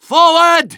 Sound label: Medic battle cry